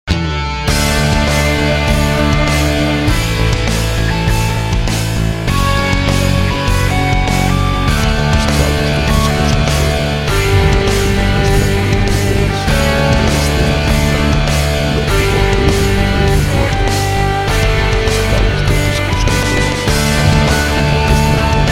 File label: rock